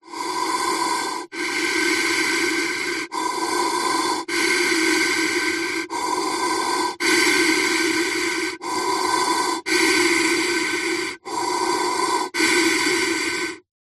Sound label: human breathing firefighter mask pov steady deep breaths